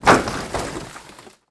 wood pile drop, firewood pile dropped on wooden floor